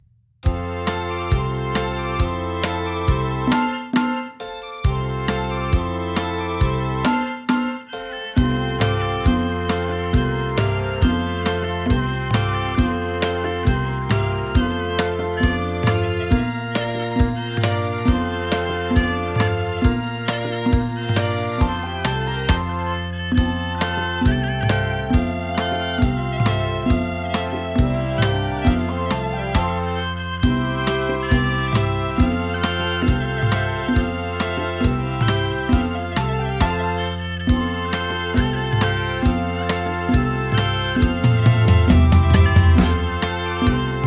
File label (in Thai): backing track, เพลงร้องทำนองไทยร่วมสมัย สำหรับเด็ก; นกกิ้งโครง; bird